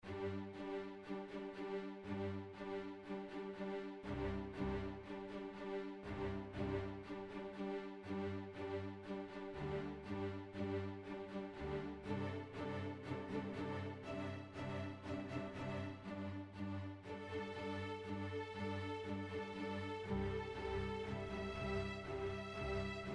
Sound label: Open Strings; Fiesta